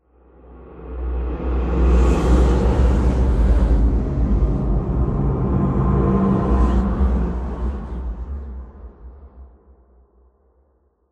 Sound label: amb unique lobby; Half Life Alyx